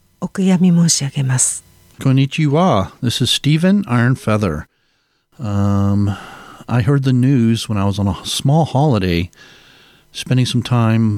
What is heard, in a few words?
vocals